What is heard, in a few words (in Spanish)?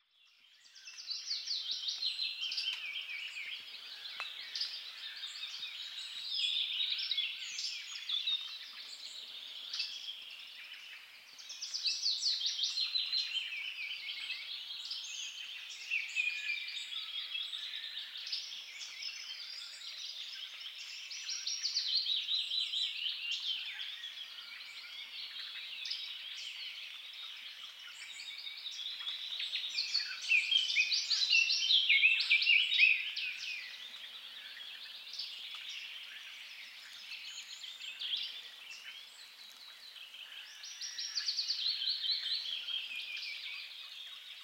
lluvia campestre relax